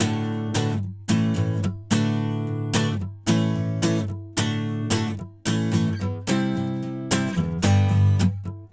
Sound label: Acoustic Guitar; Guitars